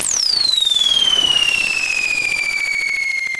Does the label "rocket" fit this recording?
Yes